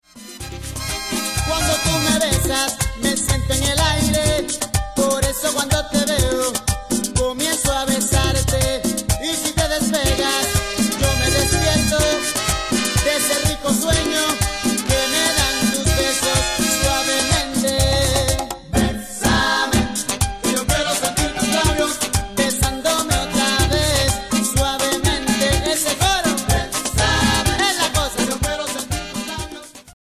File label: merengue